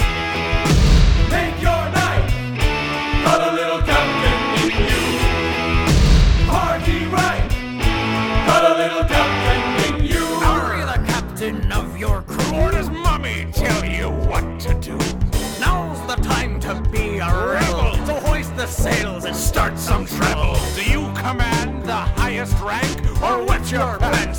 Commercial

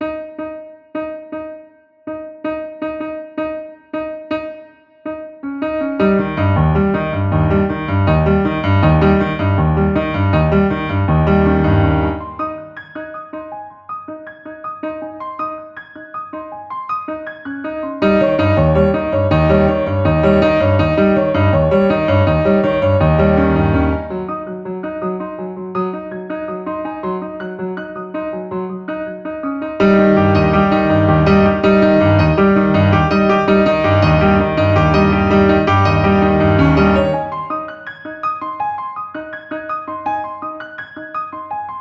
Funk Ay Etude

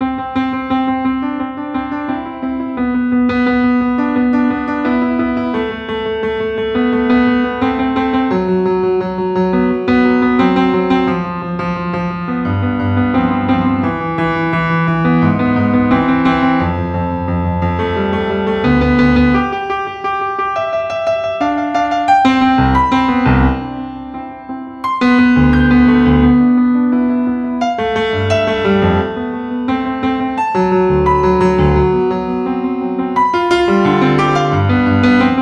Indie Rock (Two Finger) Etude

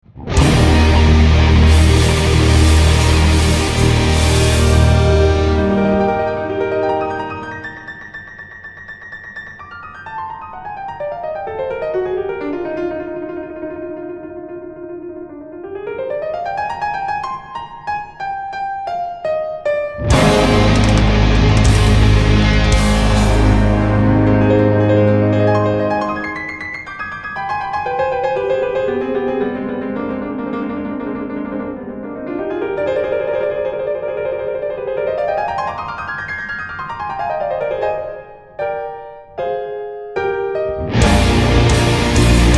Piano Concerto

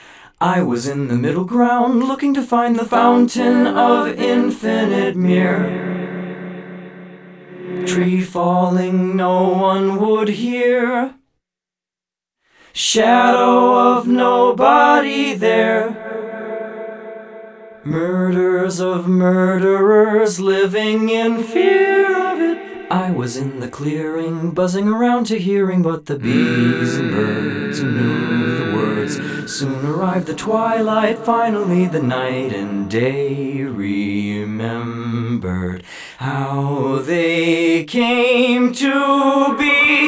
Vocal Effects

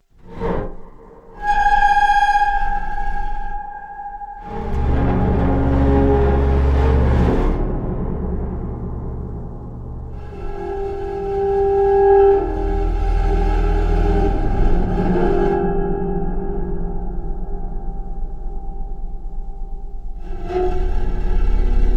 Lullaby, Sound Effects

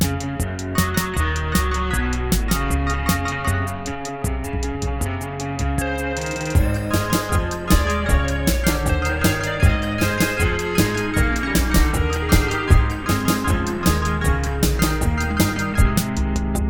instrumental

